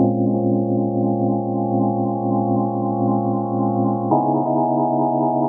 Low Till I Smoke CHORD; voxandchords

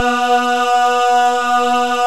MALE CHOIR

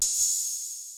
REVERSE DRUM; Rubber Chicken Software Sample Bank; DRUMS + PERC

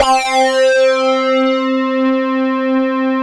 SYN JX, SYN Analog; Sound & Vision; Gigapack I CD 2

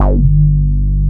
SYN BASS G2; HOUSEBASS; Synth

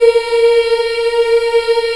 A#3 CHILD, Choir; CHILD IHP

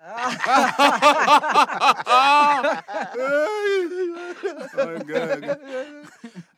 Vocal Chirp, African Voices; Quazibeat; African Secret

